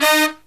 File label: LOW HIT, Brass